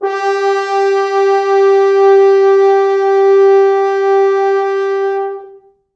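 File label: 4 FrHorns SUS, French Horns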